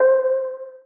PLUCK Blank (C); Melodic One Shots; Cymatics; ANALOG EVOLUTION Beta

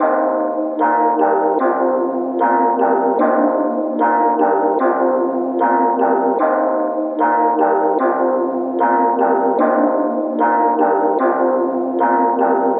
Melody Loop - 150 BPM C Min; Hip Hop; Cymatics - 9God Hip Hop Sample Pack

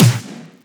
snare oneshot; GhostHack

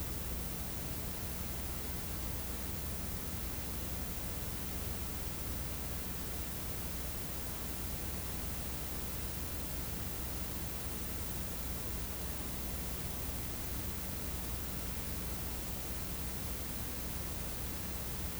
Tape Deep Noise; Lofi

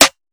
Snare - C#; Argo Snare; Cymatics; PHARAOH Beta Pack; Pharaoh Premium Drum Samples (BETA)

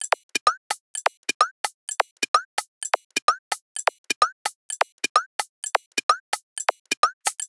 Perc Loop, 128 BPM; Drums; Loops; Top; House

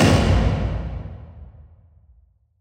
Epic Drum

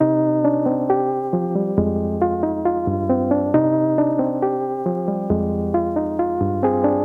Various Melody Loop - 136 BPM C Min E Piano; Melodies; Loop Stems; Cymatics; Oracle Sample Pack; Oracle Various Melody Loop 11

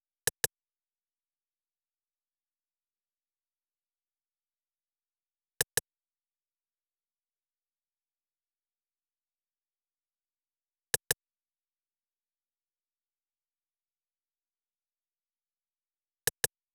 Drum Loop - 90 BPM Percussion, RnB; Distraught; Cymatics; ANALOG EVOLUTION Beta

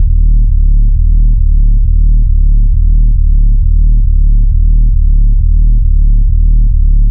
Bass Loop D# 135, Techno; Ghosthack; AC2024 - Day 1 - Techno Sounds 2025

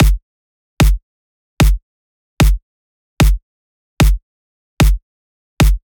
Dubstep Kit 150bpm Kick; EDM Drums; Drum Kits; Stems; Ghosthack; Drum Mega Bundle; Ultimate EDM Drums; Dubstep Kit 16

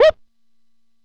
Yamaha VSS Bark; FX; Cymatics; Light Free Sample Pack; Bonus Samples

